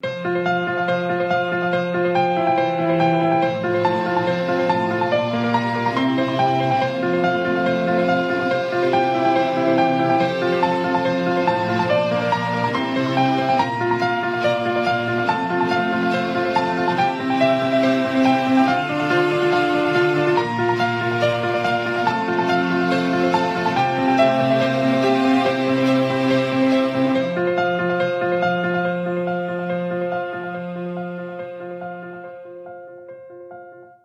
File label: samsung galaxy s23 ultra; prelude; tono de llamada